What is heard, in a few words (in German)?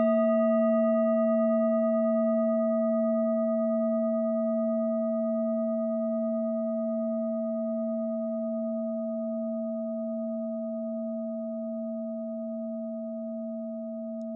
orissa klangschale